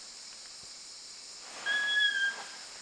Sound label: single whistle, bonobo